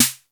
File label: D70 SD, D70 DRUMS; Crypton - DrumBase5000